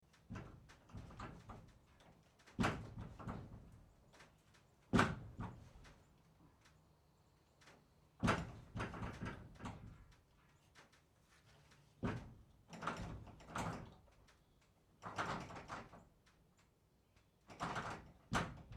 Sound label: door barn